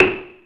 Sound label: ANA WN PERC, ANALOG PERC; Zero-G Ambient 1